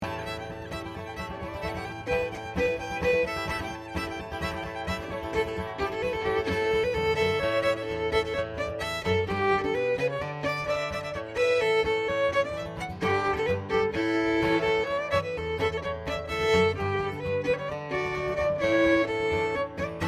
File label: guitar, fiddle; At the Downeast Coutnry Dance Festival